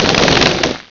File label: BULLET